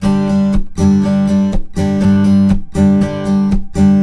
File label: Blues Guitar Samples, RIFF; ILIO; Fretworks